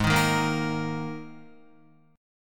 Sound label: Ab Suspended 2nd Guitar; strummed; JGuitar